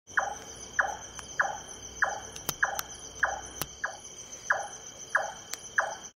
Birds at night; پرندگان در شب

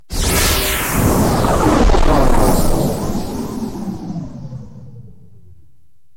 Blink Sound Effect; Final Fantasy XI

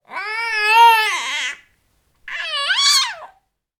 baby cooing

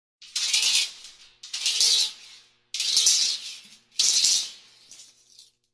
Metal wire

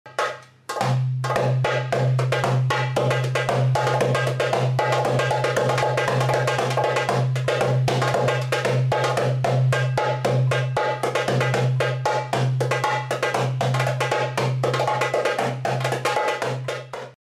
Darbuka

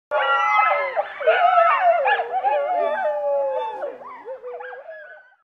Dingo; دینگو

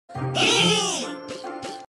Goblin

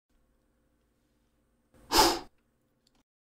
blow out candle; شمع فوت کردن

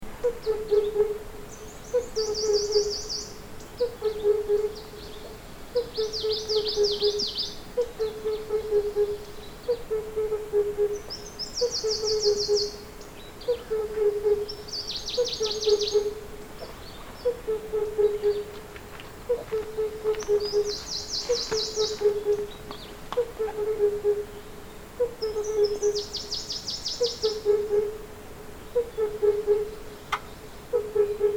Himalayan cuckoo; پرنده کوکوی هیمالیایی

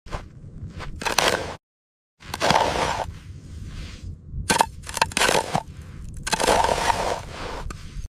garden trowel

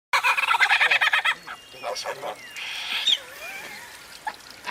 lyrebird

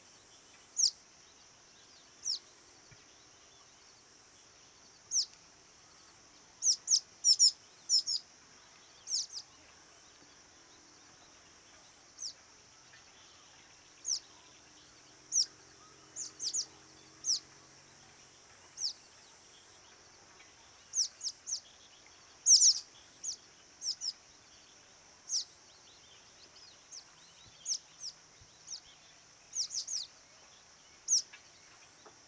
Mascarene martin

